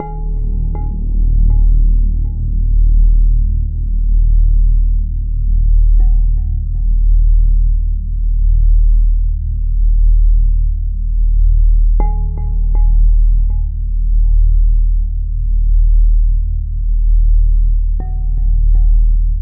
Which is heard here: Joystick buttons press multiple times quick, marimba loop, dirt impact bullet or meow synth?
marimba loop